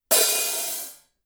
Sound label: Color Sound 900 Red Heavy Hi Hat Paiste